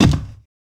footsteps land glass; player

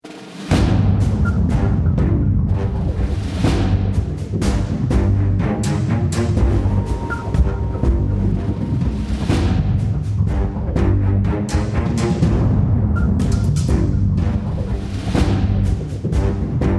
start round

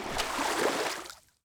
water wade; player

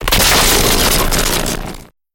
electric pulse, substation; mechanical units; Half Life Alyx

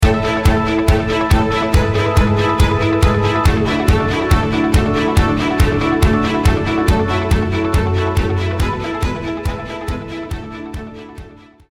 start action, music

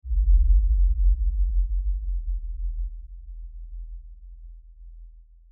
amb cavern excavation lfe; ambient; Half Life Alyx

